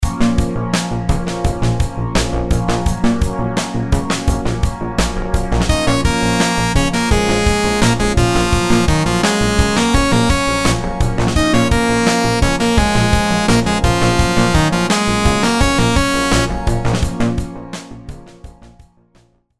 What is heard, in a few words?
rhythms simple dotted 8s 85